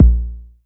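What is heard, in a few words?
Long bass drum, Jomox Airbase99, Drum Machines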